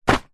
leisure game mat plastic sheet folded drop carpet floor; twister